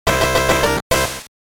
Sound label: New Game and Password Jingle; Teenage Mutant Ninja Turtles 3 Radical Rescue; Gameboy